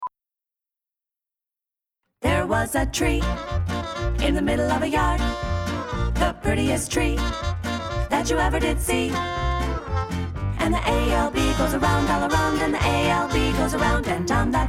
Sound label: Song Radio Mix; Affected Area Song; USDA